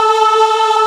VOI AAHH; Sound & Vision - Gigapack I CD 2

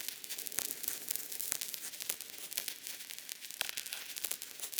Cracklature, sparse soundscape

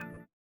VIBRA; Spectrasonics; Metamorphosis

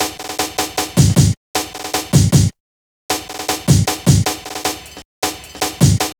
Hard Step, Drum Loops, Jungle, 155; Jungle Warfare 1&2

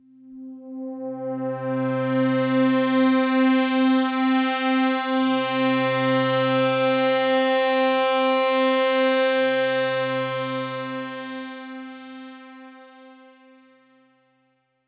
Pad C, Synth Prophet One Shots; Electronisounds

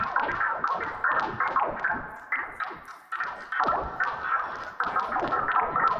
Tonal Re Draw, sparse soundscape

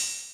crash, Millenium MPS-400

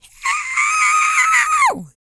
E YELL, MALE HUMAN; R&B & Dance; ILIO; Vocal Planet

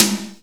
TOM, Drum Dry; East Collexion; Drum 1

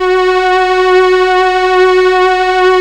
SIEL, String Machines; Best Service; XX Large Most Wanted 2; SIEL-CRUI

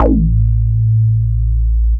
moog A; Techno Trance Essentials

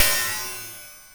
cymb, cymbals

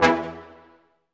BRS, Ensembl stac; Roland L-CD702

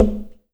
SLIT, ETHNIC DRUMS; Spectrasonics; Liquid Grooves 1 & 2

